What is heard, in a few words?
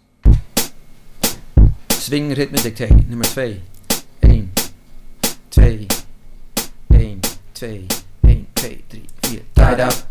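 swing rhythm; dictee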